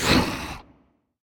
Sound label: grunt; fenrir